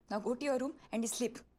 sleep

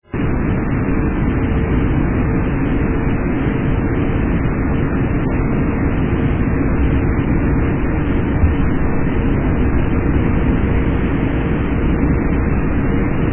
background noise

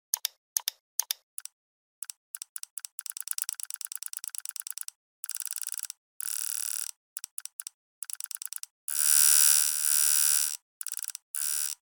fast mouse hehe

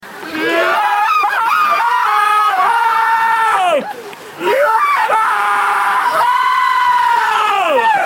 man screaming while getting chased

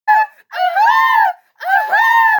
bird kids

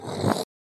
backwards explosion

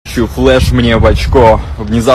goofy laugh

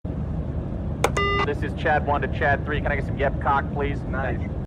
yep plane

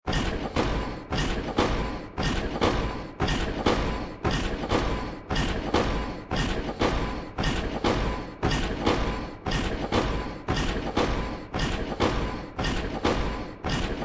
rabbit footsteps; pumpkin

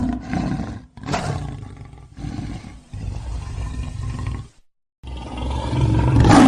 lion roaring

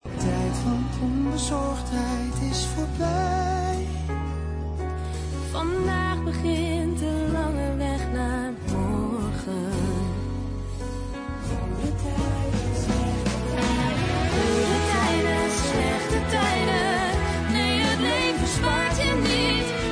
tune; gtst

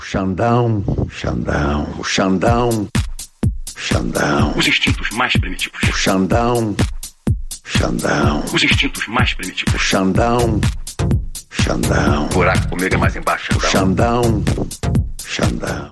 funky

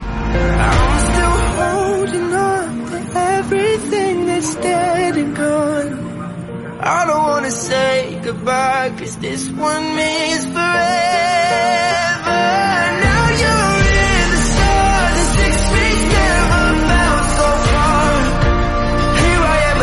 disappointed song

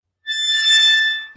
spatial gate creaking

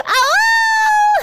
howl

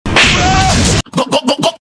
slap

